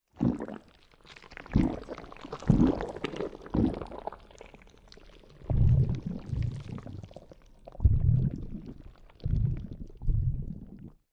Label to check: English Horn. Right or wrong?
Wrong